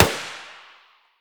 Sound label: mdr muzzle mono far, weapons